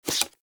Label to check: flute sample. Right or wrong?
Wrong